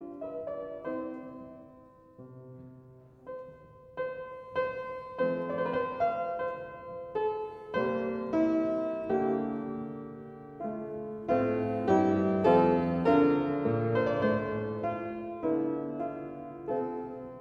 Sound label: Andante cantabile C major; Mozart; KV330 nr 10